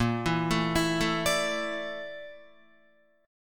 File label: Bb Major 7th Flat 5th Bb down Guitar; BbM7b5 arpeggiated; JGuitar